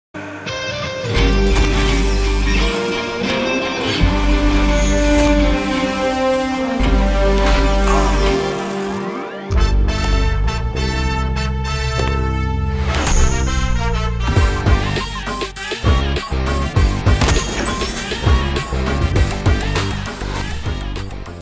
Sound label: beats; commercial bgm ringtone; villan; glimpse of pakka commercial